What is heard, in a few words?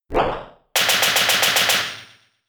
Drill Peck; attack moves; Pokemon; Gen 4; DPPL HG SS